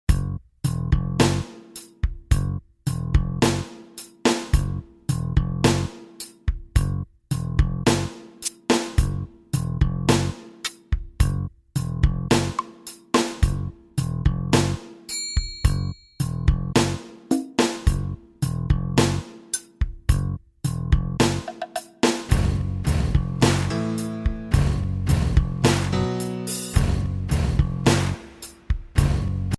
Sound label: synonym stomp instrumental